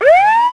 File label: cop siren stop; cars; Max Payne